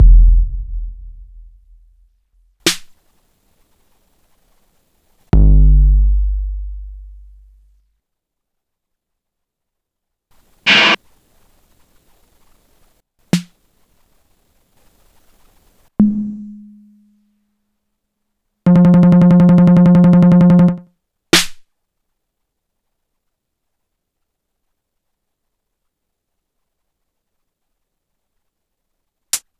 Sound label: ANA KIT SLICE, drums